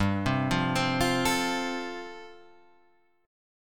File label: G 7th Suspended 2nd Suspended 4th G chord